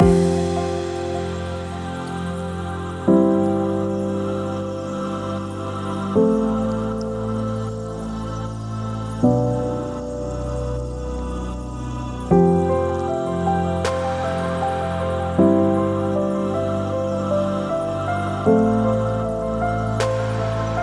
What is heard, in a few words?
Inspirational Moment Loop